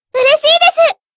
win; ff2; javelin

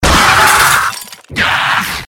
stabbed; freak fortress 2

